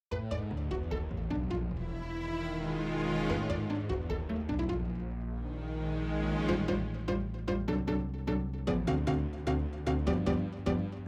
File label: solo harmonica; concertino